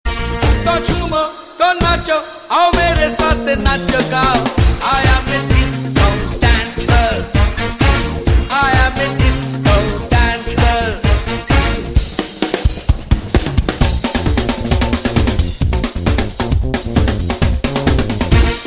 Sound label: disco